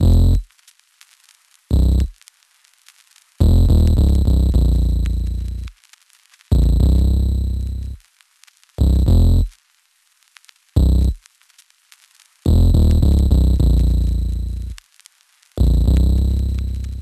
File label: Bass